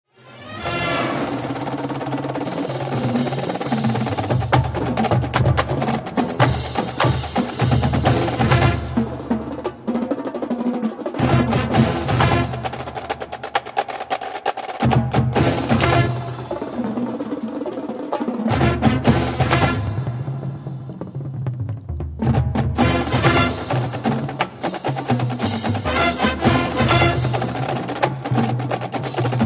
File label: Drum Feature, marching band